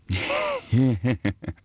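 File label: male happy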